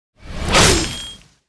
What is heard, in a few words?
Ratman Atk; Seer; GamigoNA